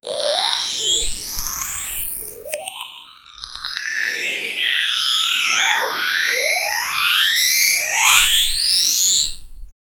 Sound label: sex sounds